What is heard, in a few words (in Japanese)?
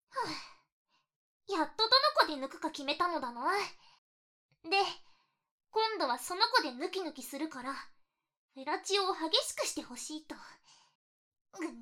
ピストンフェラボイス